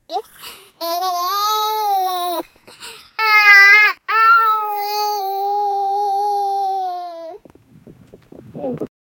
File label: happy child